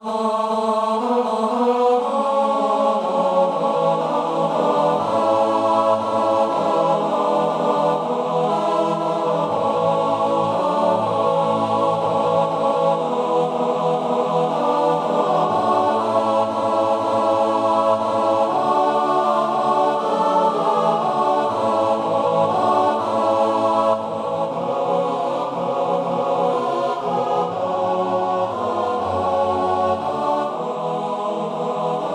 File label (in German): Maennerchor vierstimmig